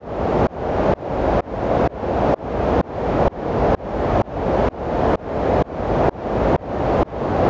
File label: Drop Noise, White Noise